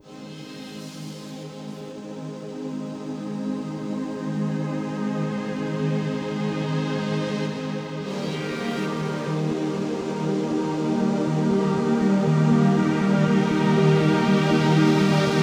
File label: Rain Shower Lullaby